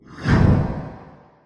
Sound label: woosh; Politburo